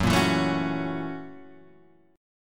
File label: F sharp 7th Sharp 9th